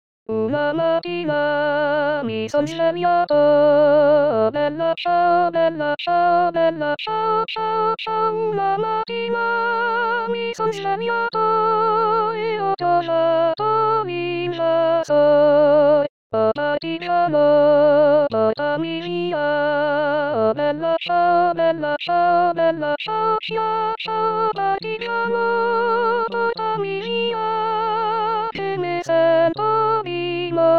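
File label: women; 2023 st jean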